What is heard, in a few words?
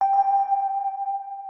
organ